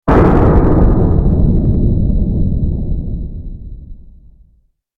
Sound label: hit; scp 106